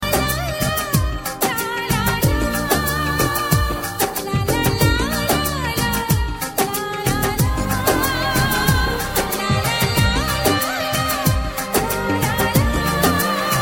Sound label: Best Vocals